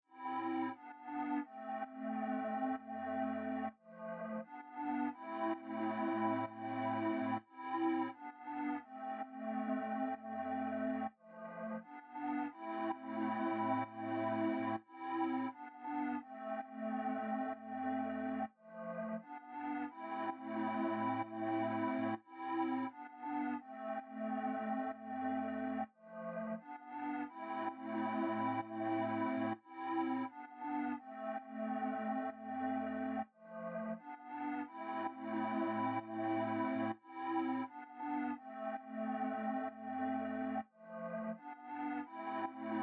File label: 130 BPM A Min Pad; Trap; Melody Loops; Loop Stems; Cymatics; Nightmares; Champ